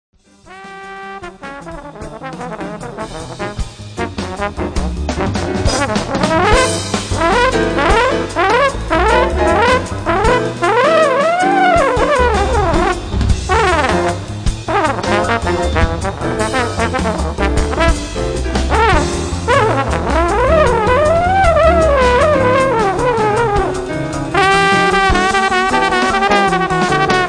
FAST SLIDE

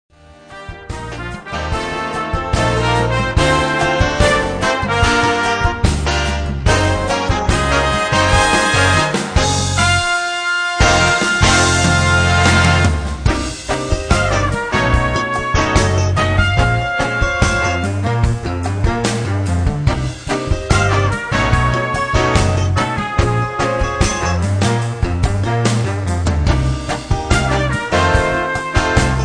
SLOW SAMBA

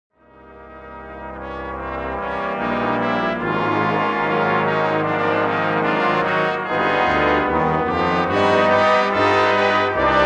FAT BRASS; Classical-Church

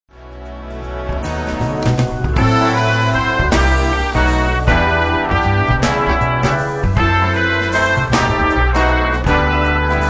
WALTZ

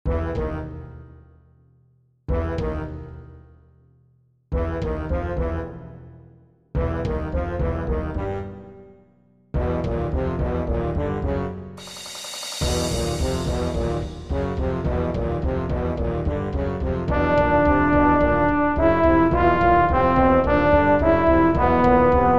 Latin Suite Scary Music

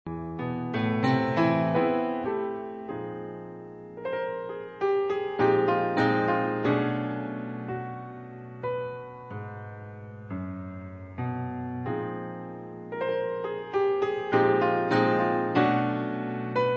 PIANO

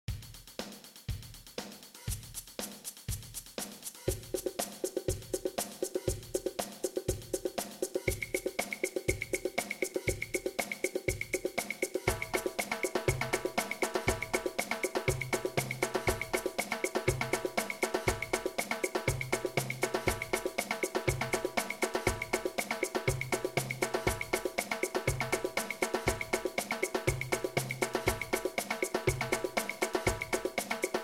Funky Perc

